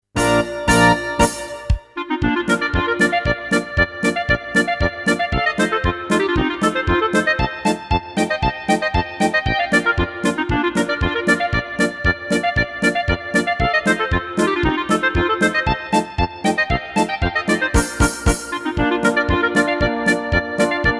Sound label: Clarinet polka